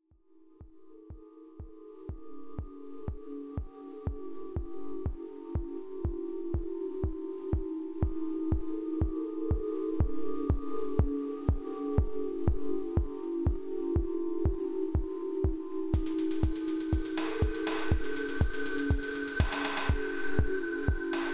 SunVox, techno

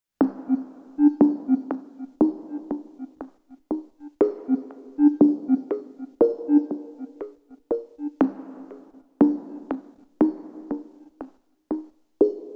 Syntakt, On the Chill side, 30 BPM

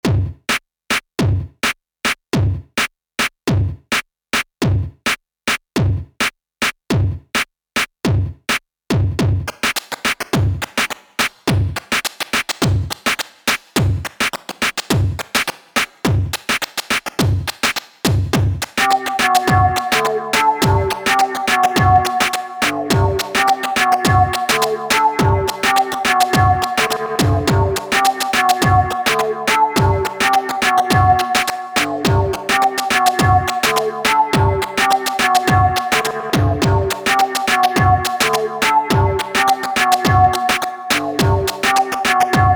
lyra-8 inaugural (for me) noise drone

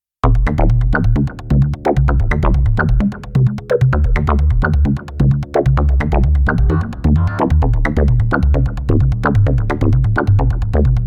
Syntakt with some EQ & compression

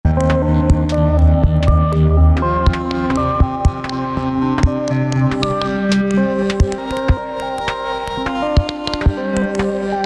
MEK, Beads, Pinging Blades for the kick and perc

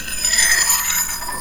a metal scracth

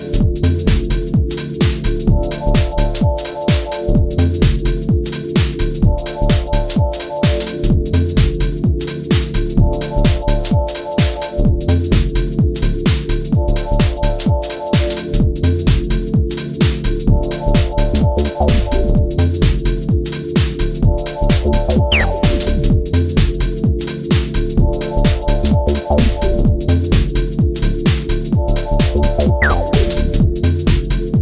Two from A4, one from SH-4D (SH-101 engine)

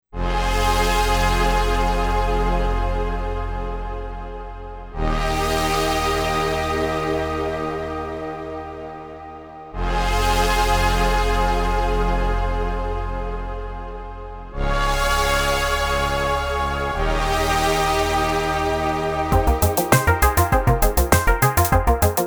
A soft nostalgic pop thing, exploring pads; Syntakt; SY Tone; This is a rework of something I did on the Digitone ages ago.

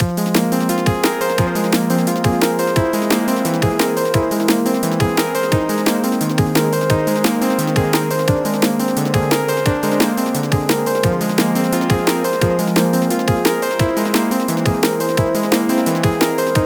Arturia Jup-8 V4 and some D&B drums

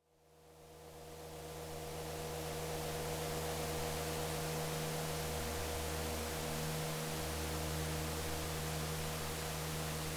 example of lfo mapped to pan param; rytm 1.70

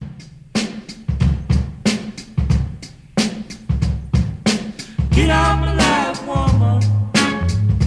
drum breaks; a couple of my favourite drum breaks that I’ve used many times over the years; hip hop beat battle; loosely rock themed

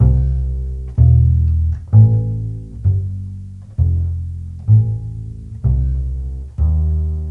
an upright bass player going through a scale; hip hop beat battle; loosely rock themed

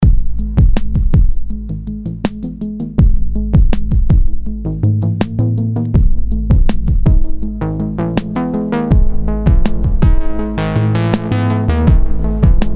even more overdub, drums are also system 35

